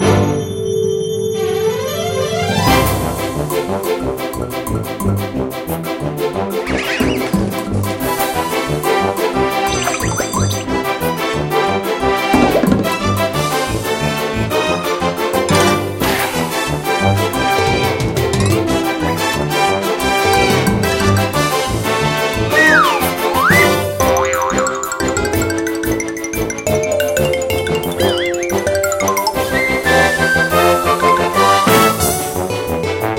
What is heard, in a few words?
Bowling Loop; Elf Bowling Hawaiian Vacation